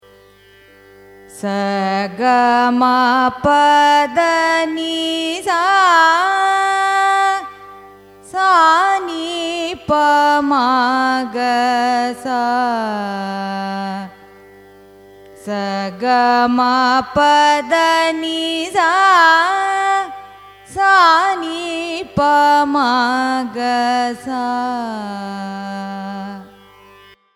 raga bahudari arohanam avarohanam; carnatic music

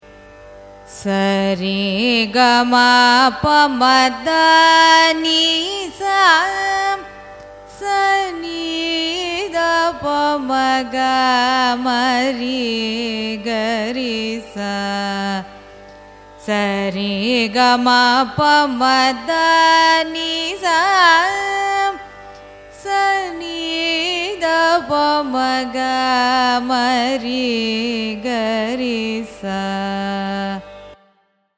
raga sahana arohanam avarohanam; carnatic music

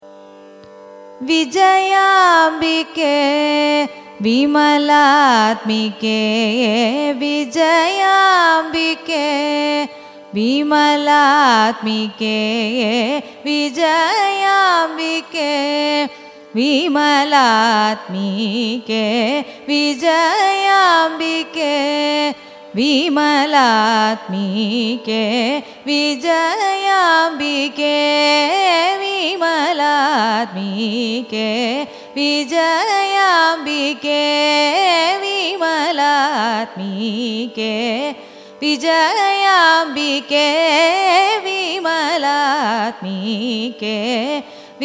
raga vijayanagari carnatic; carnatic music

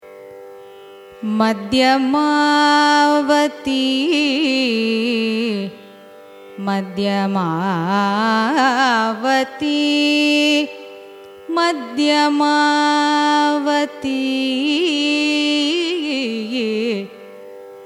raga madhyamavati signature, carnatic music

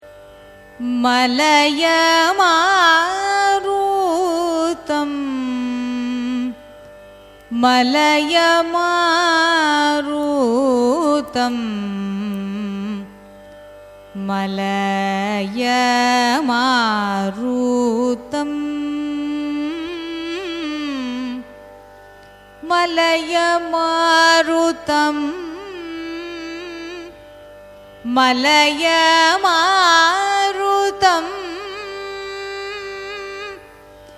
raga malayamarutham signature; carnatic music

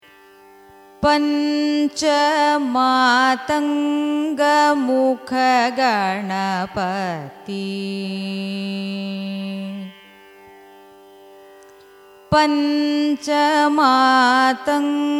raga malahari carnatic; carnatic music

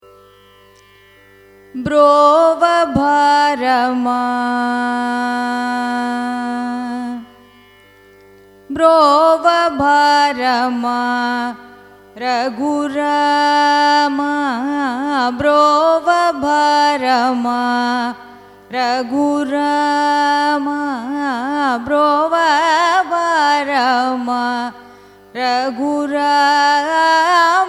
raga bahudari carnatic

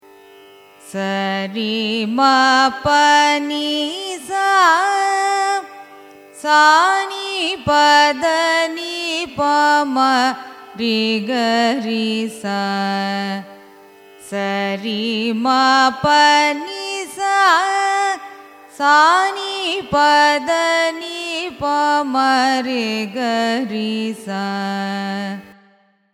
raga shri ragam arohanam avarohanam; carnatic music

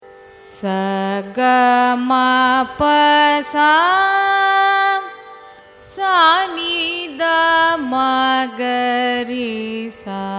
raga navarasa kanada arohanam avarohanam; carnatic music

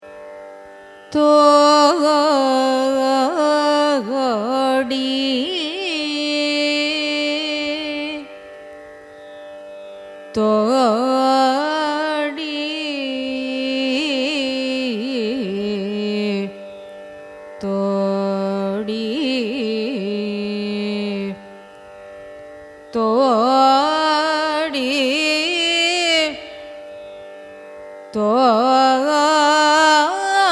raga thodi signature, carnatic music

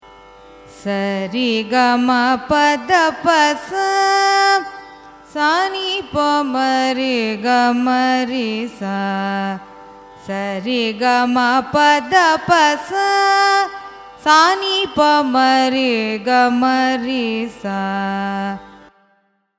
raga poornachandrika arohanam avarohanam; carnatic music

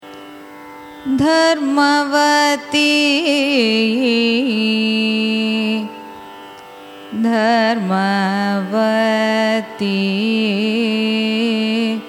raga dharmavati, carnatic music

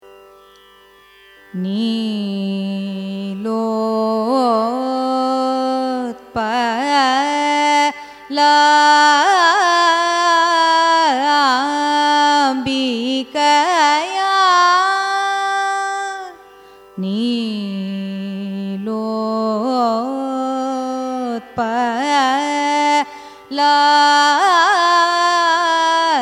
raga kannada gowlai carnatic; carnatic music

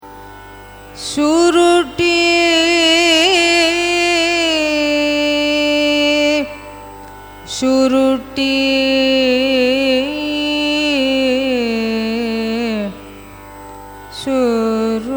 raga surutti signature, carnatic music

